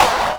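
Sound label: percussion FX, Dance Drums; Best Service; Dance Mega Drums CD2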